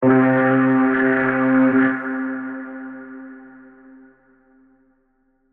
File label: Synth Lead C, Trap One Shot; HipHop; Melody Kits; 130 Bm; Ghosthack; Ghosthack - AC2024 - Day 5 - Melody Kits; Flowers